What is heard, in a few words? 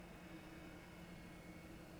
pulses, BALAENOPTERA; MONACO 2022-07